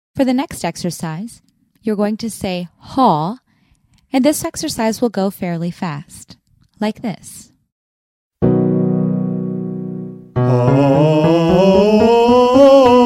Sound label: Octave male